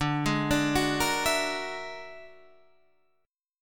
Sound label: E 9th Flat 5th D, guitar